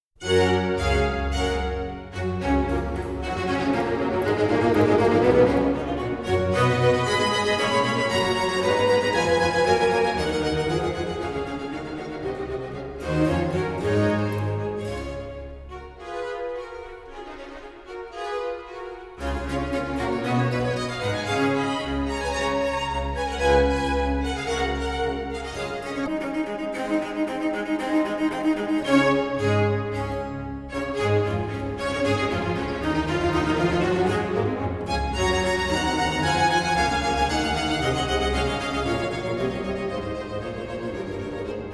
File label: Cello Concerto in G Major Allegro; RV 415